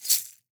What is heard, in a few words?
Stacked Shakers; World Percussion; Cymatics; Emperor World Percussion